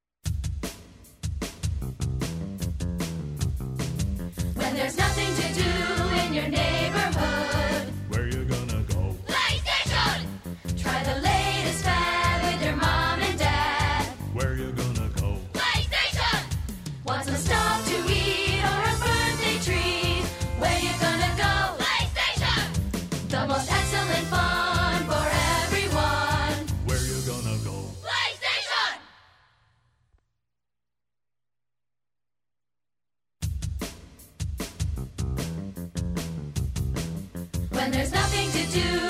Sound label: Jingle; Play Station